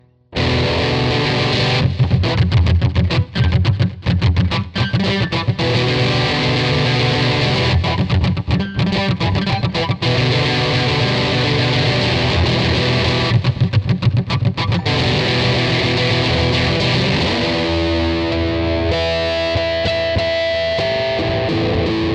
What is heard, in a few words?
electric